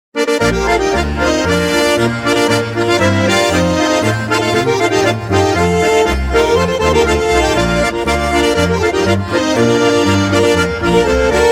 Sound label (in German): Titschi Marsch